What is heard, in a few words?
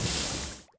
character Extinguish